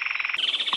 Cichlocolaptes mazarbarnetti rattle x Philydor novaesi rattle